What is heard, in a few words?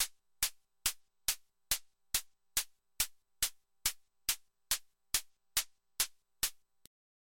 hat